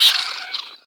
Monster Worm type Death; Inotia 3

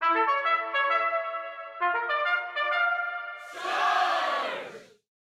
trumpet charge; baseball

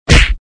Hit; mega boulder rampage gamerip

